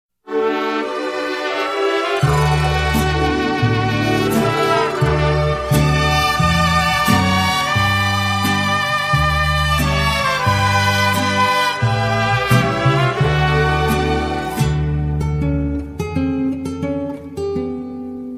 ringtone

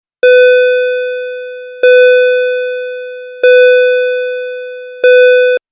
school ding a ling

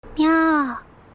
myaaa

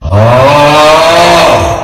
snore reverb

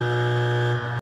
buzzer sound effect; britains got talent 2016

